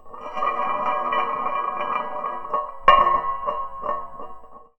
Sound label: Hi hat, contact mic, Marbles; musicradar; contact mic madness; Raw